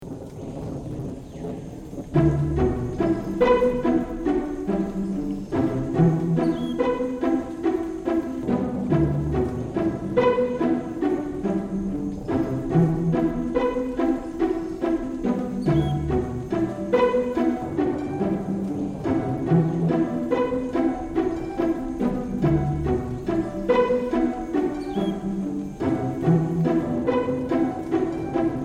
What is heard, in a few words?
stringed rain